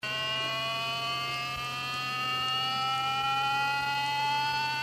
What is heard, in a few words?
chirp